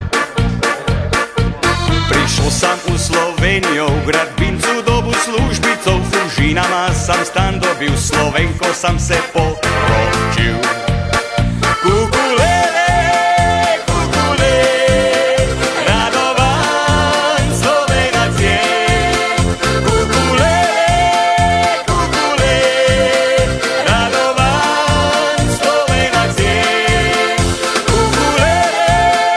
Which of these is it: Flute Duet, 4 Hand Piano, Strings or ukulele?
ukulele